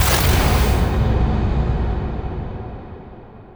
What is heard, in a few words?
cast; Spark Wraith